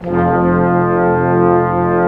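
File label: Section, Brass Large Ensemble long; Roland L-CD702; Lrg.Ensemble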